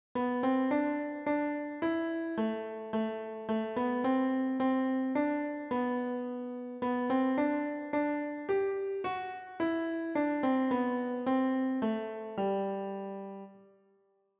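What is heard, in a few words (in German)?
Schneeflöckchen Weißröckchen Klavier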